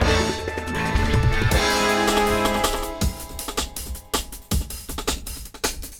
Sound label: Combat END; bolt 2008 pc gamerip